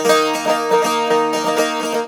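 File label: D#, bouzouki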